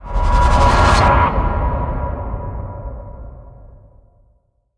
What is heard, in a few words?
End Sting; Void Bastards